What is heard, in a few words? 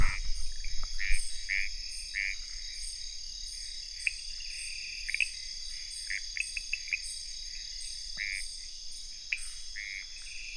Rhacophorus appendiculatus; Kurixalus appendiculatus